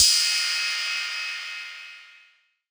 crash, cymbals, drum one shots; Crashes; Cymatics; Cobra Hip Hop Sample Pack

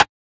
Snare, B; Drums; One Shots; Humble King Snare; Cymatics - Humble Hip Hop Sample Pack

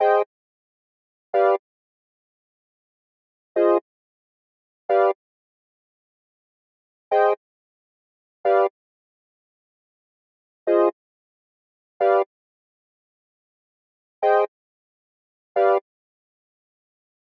135 BPM C Min OB-X Bass, Synth; Loop Stems; Cymatics; Fantasy Synth Sample Pack; Fantasy - Tell Me; JU60+OBX+REV2

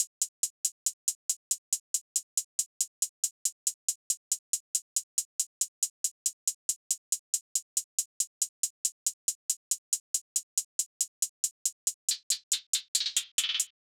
Drum Loop 139 BPM Hihat, Hip Hop; Drum Loops; Loop Stems; Cymatics; Monaco

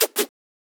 FX - Scratch, Hip Hop; Cymatics - 9God Hip Hop Sample Pack

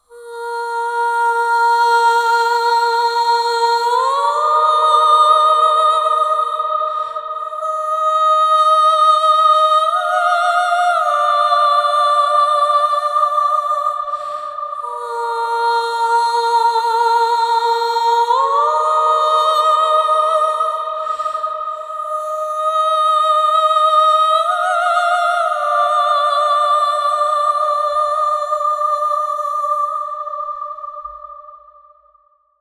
Counter Vocal (WET); Construction Kits; VOCALS; Cm 65Bpm; Ghosthack; SkyRealm; Kit 02 - Solomon Hill

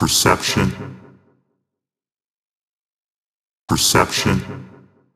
Vocal Loop 130, Techno

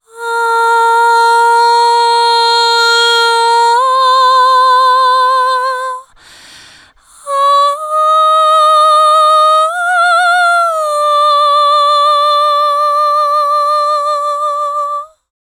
Top Line Vocals, Cm 65Bpm; Top Line Vocals 2; Construction Kits; Ghosthack; SkyRealm; Kit 02 - Solomon Hill

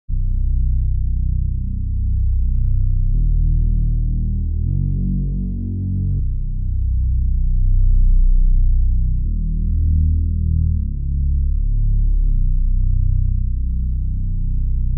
157 BPM D# Min Bass; RnB; Melody; Loop Stems; Cymatics; 2022 Melody Collection; Free Your Mind

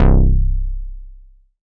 Moog Model D BASS Grit - C; Synth; One Shots; Cymatics; Fantasy Synth Sample Pack

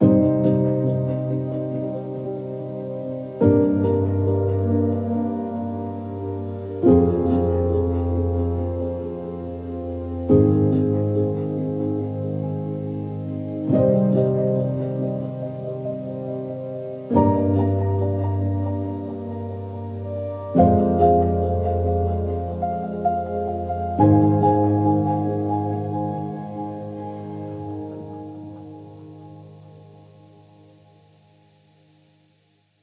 Piano - Cm 70BPM; STEMS; Ghosthack; SkyRealm; Mood Kits; Mood Kit 09 - Washed Ashore

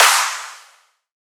Riddim Snare Clap

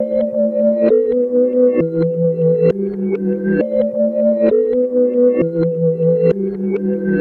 Reverse Melody Loop - 133 BPM E Min; Cymatics; Oracle Sample Pack

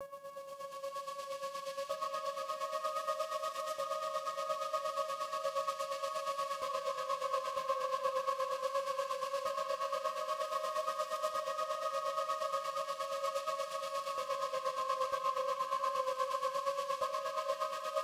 127 BPM G# Min Pad, Euphoria; Melodies; Cymatics; GHOST (Beta Pack); Allured

